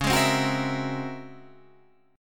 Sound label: C sharp Minor Major 13th, guitar chord